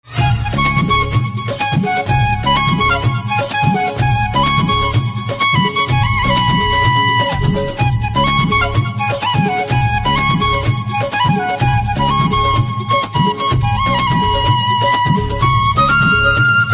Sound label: Flute Instrumental Ringtone